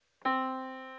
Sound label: yamaha Disk